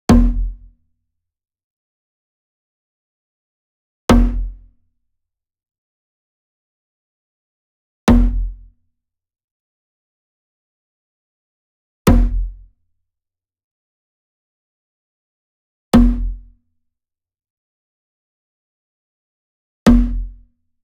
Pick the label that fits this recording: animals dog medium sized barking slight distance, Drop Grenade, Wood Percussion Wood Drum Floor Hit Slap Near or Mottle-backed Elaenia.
Wood Percussion Wood Drum Floor Hit Slap Near